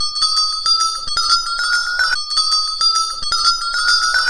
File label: Rising Bell; Electronica; Metal